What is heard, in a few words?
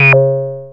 Anasound Short hi C, Industrial Analog Single Notes; Sonic Foundry (Sony Creative Software)